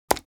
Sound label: Large button